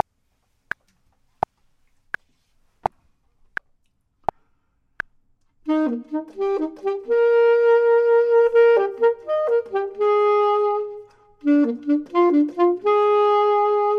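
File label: top, Saxophone and Clarinet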